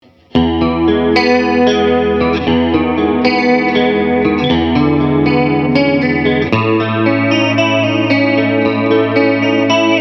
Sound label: triangle